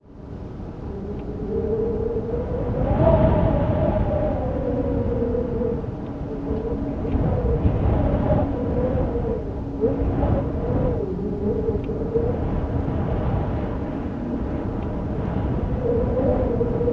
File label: weather wind medium howling wind shutters rattle; sound effects one